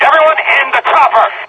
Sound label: chopper, soldier; Left 4 Dead 2